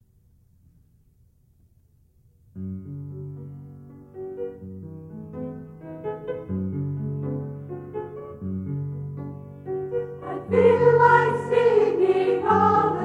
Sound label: Vocal School; Glory to God